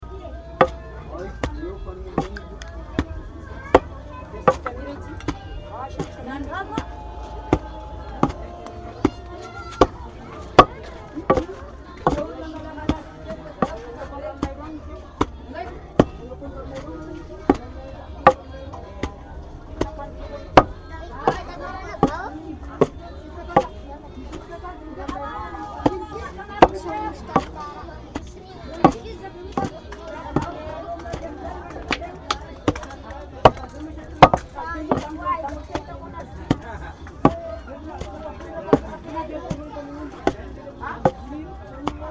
piler feuilles de ravitoto

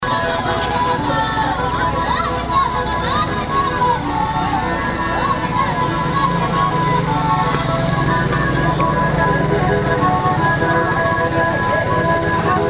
chant d'entrain

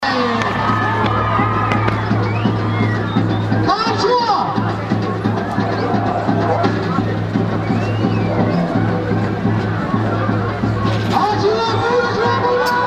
l ambianceur chauffe les gradins

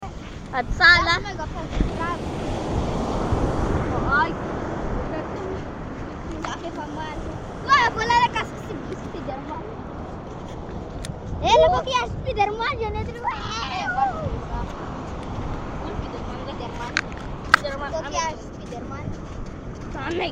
ocean coquillage plage rires

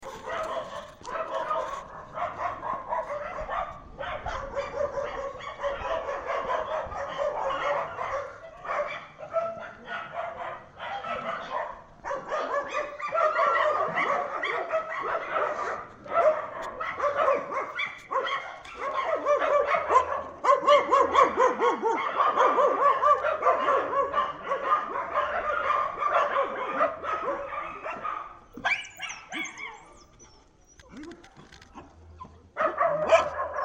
chiens nuit ville haute

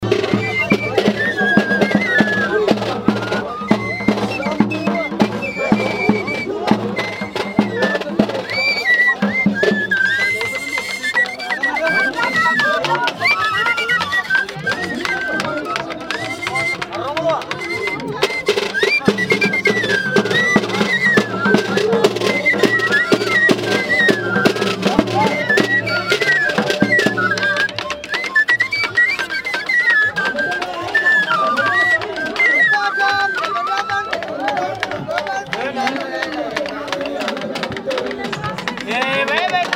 pipo et tambour durant tolonomby

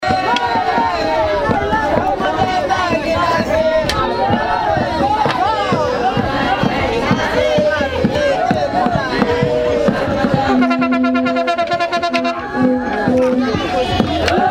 chant danse; danse des Rambo

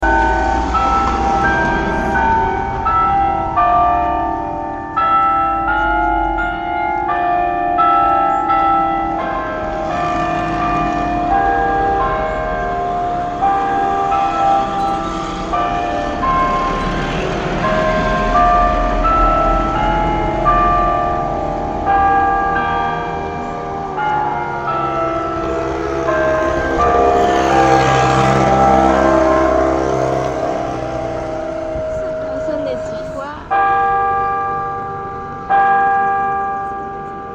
cloche cathedrale

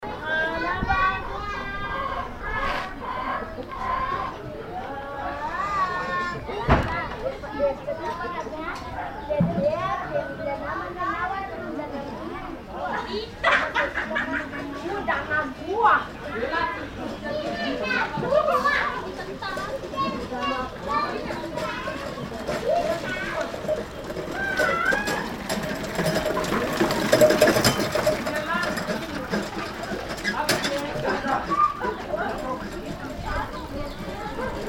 rue enfants mobylette en roue libre, fianar

